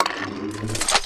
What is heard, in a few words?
Gun Reload Rocket Launcher, Weapons Foley; SFX Kit